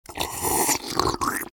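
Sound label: engineer guzzle; vo; taunts; tf2; engy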